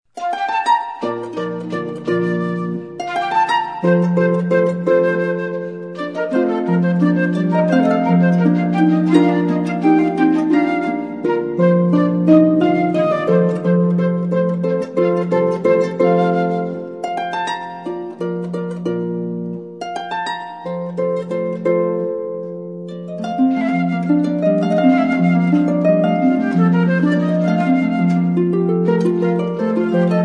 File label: Duo, harp; classical; Handel: Bb Concerto